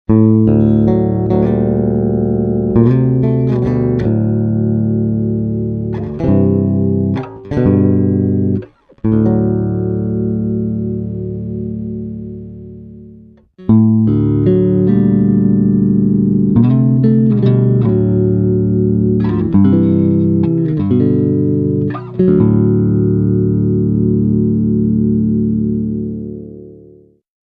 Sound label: Bongo Intonation